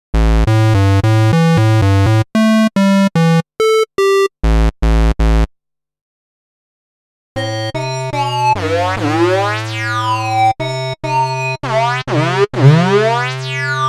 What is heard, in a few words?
yamaha ex5r sounds fdsp flange square raw and flange